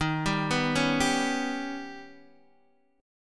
Eb Augmented 7th Eb, Guitar DADGAD

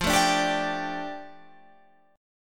F 6th Add 9th F down Guitar Half Step Down, chord

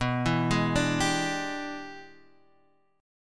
E 7th Sharp 9th B, chord

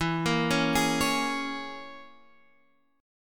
E 6th Flat 5th, Guitar, Half Step Down

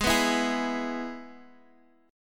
Ab Minor Sharp 5th, Guitar, Half Step Down; strummed; Abm#5 Chord

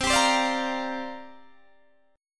C 9th Suspended 4th C down Guitar Open D

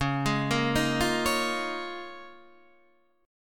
Db 6th Add 9th Db down Guitar DADGAD

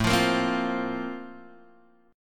A sharp Major 7th Suspended 2nd

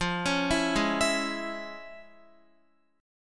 F Minor Major 7th Sharp 5th Guitar; FmM7#5; arpeggiated; x,8,11,9,8,11

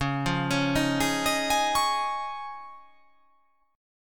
C sharp Major Add 9th

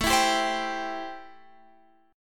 A-Minor 7th A Guitar Drop D

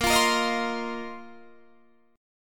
Bb Suspended 2nd Suspended 4th Bb Guitar Standard; Bbsus2sus4 strummed; 18,20,20,20,18,20; JGuitar

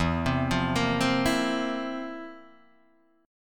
F Major 7th Suspended 4th E

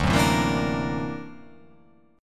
D Augmented 9th down, guitar

